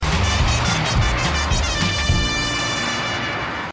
sms ringtone

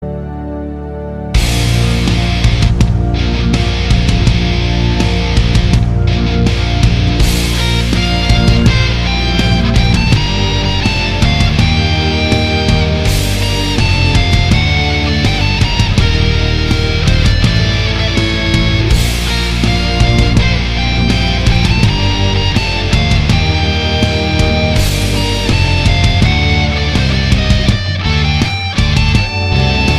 Rock; Jurassic ParK

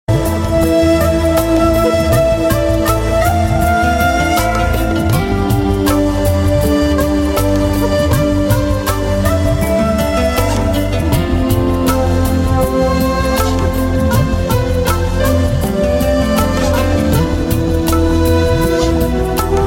Best Flute Ever

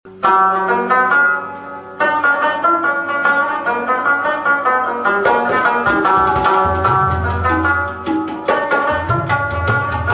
Persian Rebab

